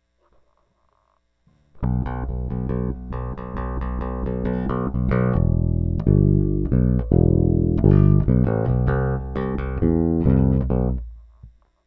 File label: bass